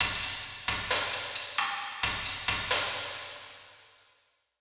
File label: drum kit; Diffusion; Processed